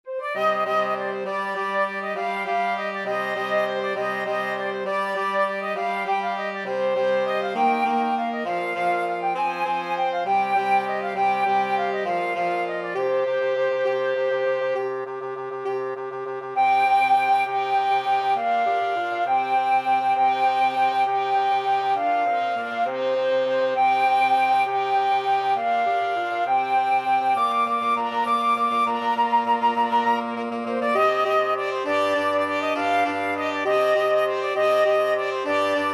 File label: flexible mixed ensemble, 4 players